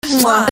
mwah

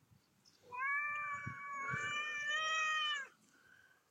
cat meow cica macskanyavog

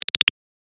minimal pop click ui

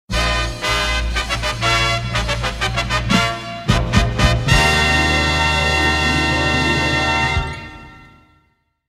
award winners fanfare

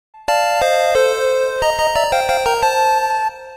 item found hidden sound effect; pokemon red blue yellow; pokemon rby

